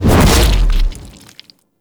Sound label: Blood Impact; Warframe; Garuda